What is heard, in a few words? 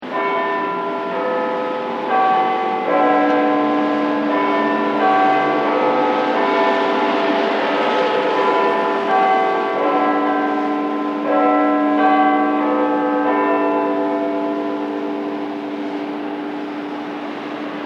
Big Ben Midnight Traffic; Bells; Musical